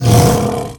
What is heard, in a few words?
death black drake; dota; creep deaths